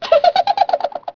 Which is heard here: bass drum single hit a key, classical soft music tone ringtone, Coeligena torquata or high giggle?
high giggle